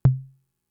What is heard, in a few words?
Tom, Fricke MFB-522; TOMS